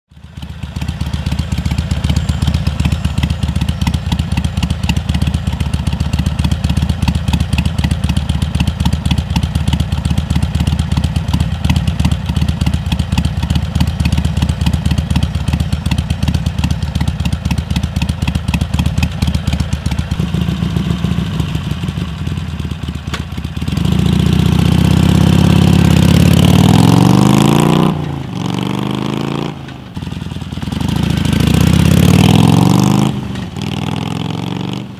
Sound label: Screamin Eagle Exhaust; motos exhaust